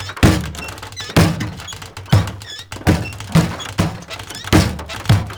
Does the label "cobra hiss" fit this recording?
No